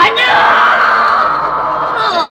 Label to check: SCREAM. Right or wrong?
Right